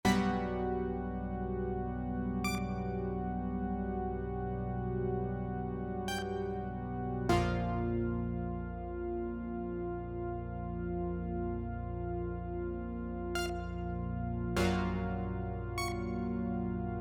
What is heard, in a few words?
Ambient; PinkAI; Max for Live; 4 Chamber Sound; generative music